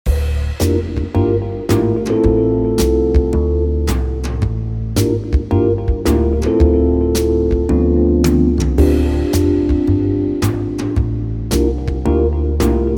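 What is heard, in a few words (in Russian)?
легкий джаз, музыкальные подложки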